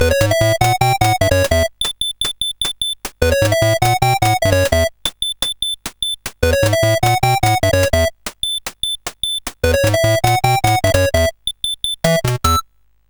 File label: sid, CSG8580R5; Coco-Notes